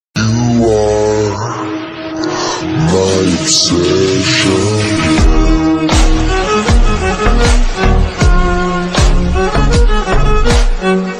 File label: slowed song remix